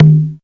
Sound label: conga lo